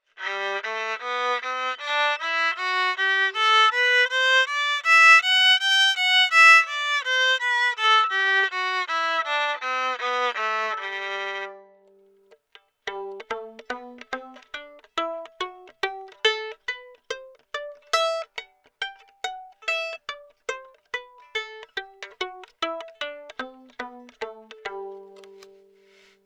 Violin RAW PIEZO

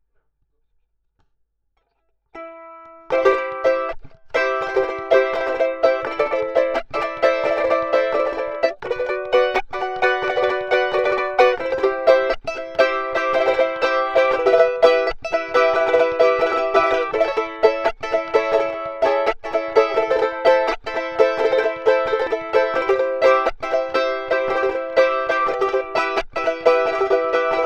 Charango strum PREAMP

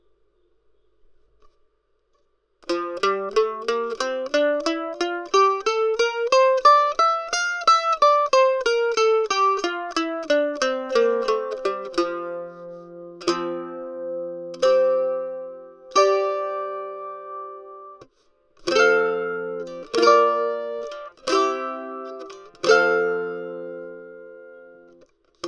Mandolin PREAMP

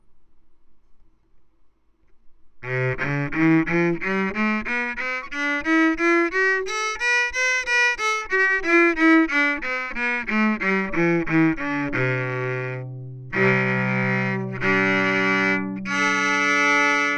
Viola PREAMP

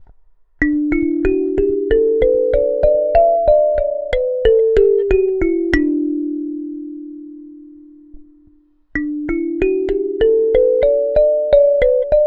Kalimba PREAMP

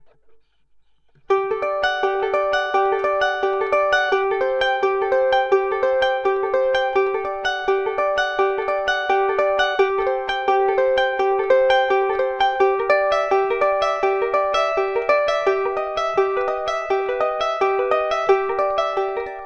Charango fingerpick PREAMP

